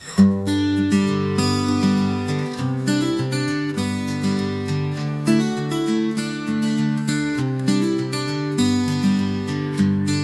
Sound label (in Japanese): アコースティックギター 暗い